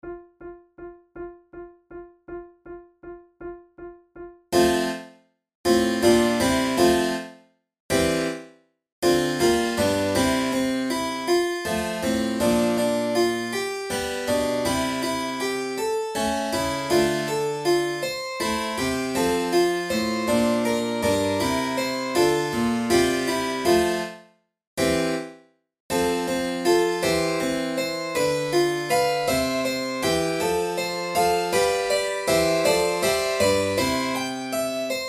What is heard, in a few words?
corelli violin sonata op5 no4 iii vivace, flute